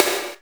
Snare, Akai MPC500

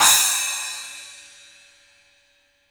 CYMBALS; 3D Sound; 3D Drums

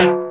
TR-505 Timbale; Roland TR-505

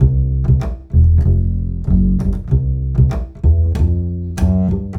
Acoustic Bass

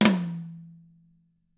TAMA TOM; ILIO; Double Platinum Drums 1

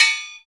PAN, Misc Metals; Club-50 - Foundations Roland; CowBel+Metl

